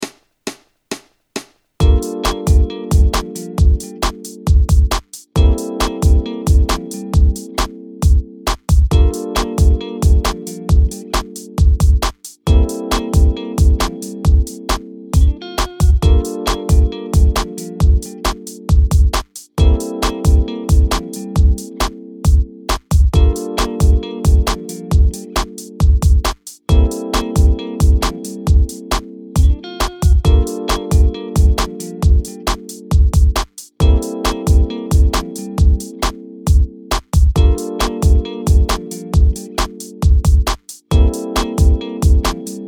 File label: palm mute, bass playalongs